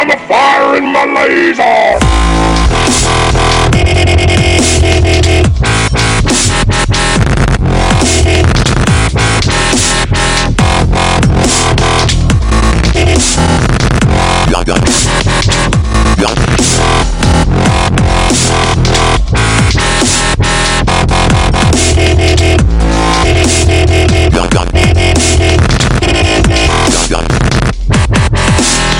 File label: Laser Dubstep